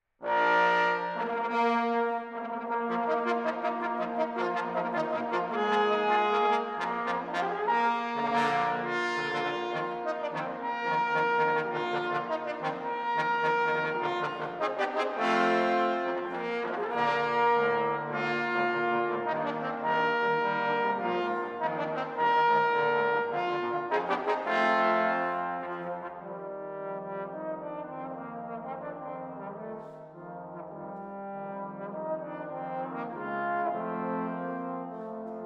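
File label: trombone; star wars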